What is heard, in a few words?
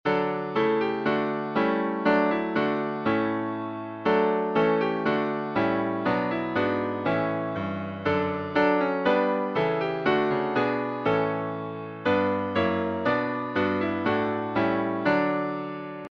Hymns